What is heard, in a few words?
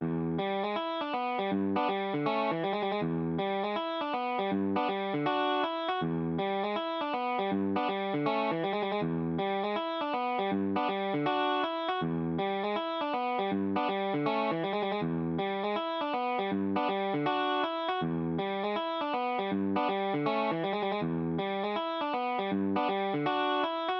in time, metronome; use a metronome